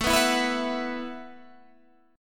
B 7th Suspended 4th A

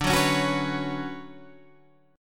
D Major 13th D down Guitar Standard; strummed; JGuitar

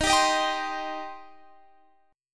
G Suspended 4th Sharp 5th D sharp

